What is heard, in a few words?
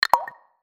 unlock notification; Orange Rise 54